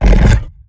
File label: Exertion Light; Evolve; Behemoth